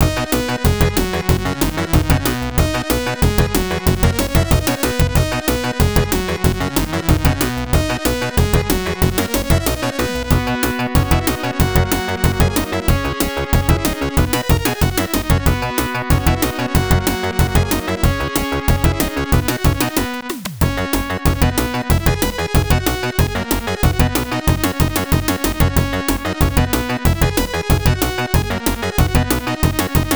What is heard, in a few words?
Can-Can (Infernal Gallop); lemmings; genesis